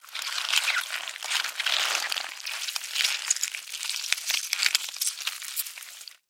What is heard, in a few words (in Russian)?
zvuki cherviakov